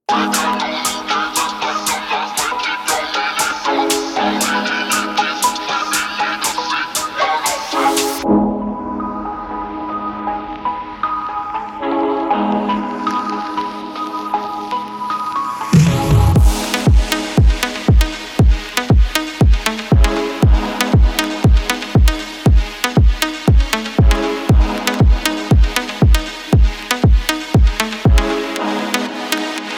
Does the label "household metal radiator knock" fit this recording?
No